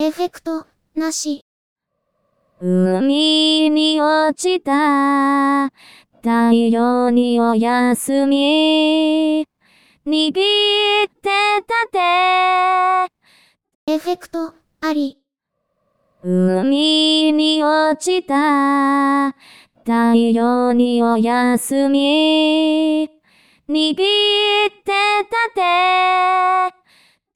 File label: reverb